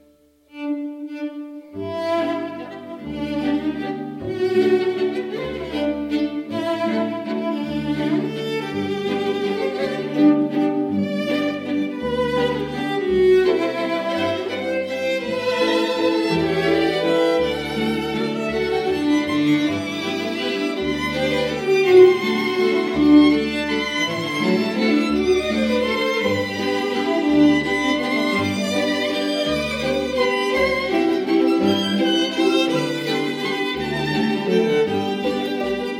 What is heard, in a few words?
classical, Happy Birthday